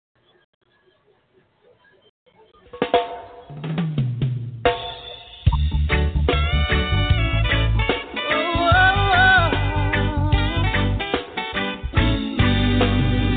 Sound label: reggae, the best music from jamaica; reggae 360; reggae nation; caribbeanradioshow